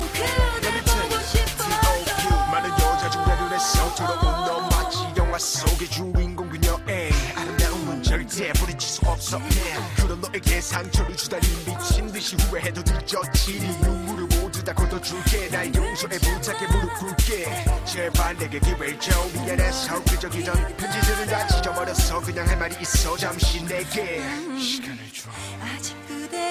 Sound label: rap; christian